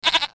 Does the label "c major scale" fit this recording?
No